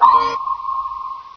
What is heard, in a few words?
beacon